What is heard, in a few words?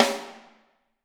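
snare drum, Drums; Bob Clearmountain Drums I; SD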